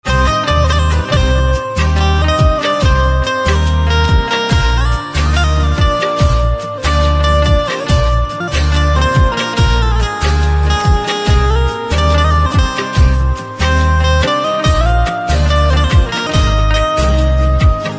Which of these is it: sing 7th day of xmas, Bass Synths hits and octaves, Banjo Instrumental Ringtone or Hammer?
Banjo Instrumental Ringtone